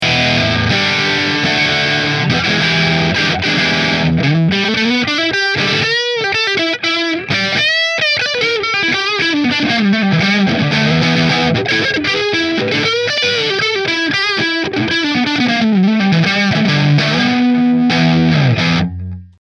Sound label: Aria Pro Neck Marshall, guitars